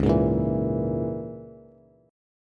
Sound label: Db Minor 6th Db Bass; Dbm6 strummed; JGuitar